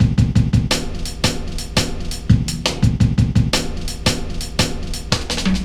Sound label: Break, Drum & Bass, Beats & Breaks